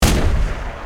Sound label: WEAPONS chain Gun Shot; Barotrauma; EK modern